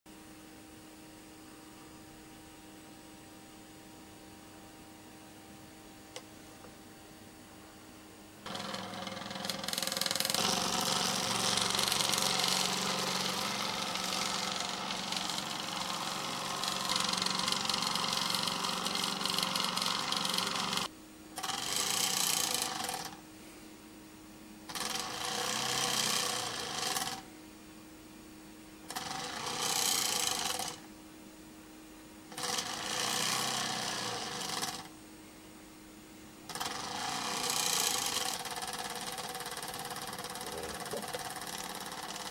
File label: Squiggle, Piezo Acoustics